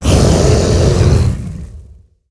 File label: Core Rager Attack